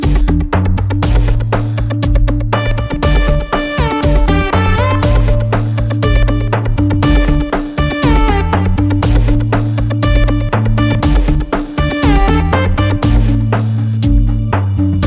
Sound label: African Nights Ringtone